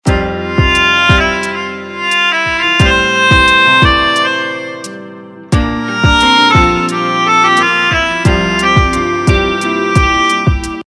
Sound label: Music